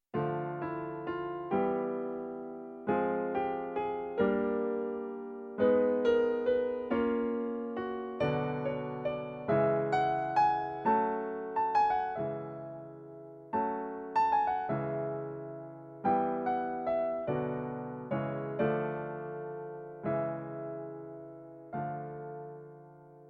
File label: minor, jazz piano